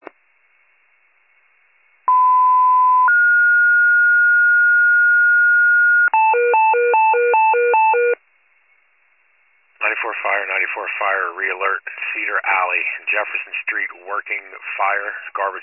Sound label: FIRE pager alert